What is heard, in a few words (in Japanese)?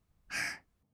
吸う音, ブレス; ASMR; 1200 kawaii voice!!